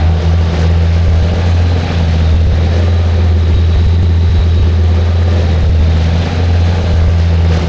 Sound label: warrior engine idle